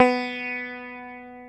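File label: electric SITAR B2, ETHNIC; AKAI S-Series CD-ROM Sound Library VOL-8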